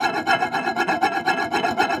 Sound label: plate loop, plate spinner; Ringling Bros. and Barnum & Bailey; Wii